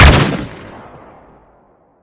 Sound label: weapon; Cryogen III